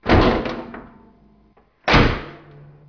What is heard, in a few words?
vehicle